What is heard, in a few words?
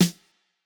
snare; Reference Beat BPM120; j city pop 1980